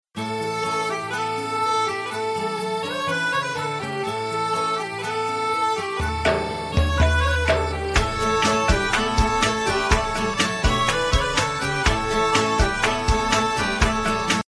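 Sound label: instrumental ringtone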